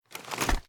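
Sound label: foley assault rifle akilo47 to grenade launcher; weapons; viper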